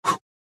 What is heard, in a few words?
Punch